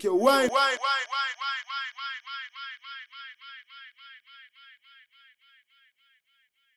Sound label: whine vox, grime, 140bpm; Zero-G Sound Sense Grime Scene; GS Construction Kits; Its Primetime